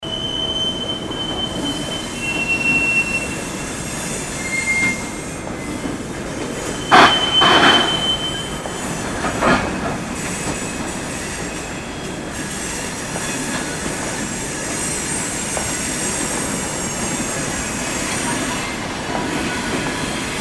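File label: sound of railway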